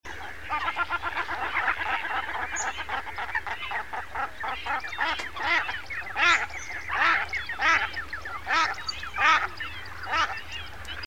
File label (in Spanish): tarro blanco